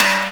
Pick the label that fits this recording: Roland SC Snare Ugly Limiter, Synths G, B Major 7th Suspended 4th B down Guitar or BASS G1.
Synths G